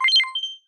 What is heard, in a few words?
Darts; Sfx Darts